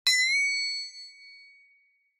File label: Accent